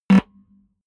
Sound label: button, signale; soundpool